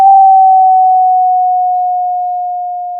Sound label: pop, Sound of a school bell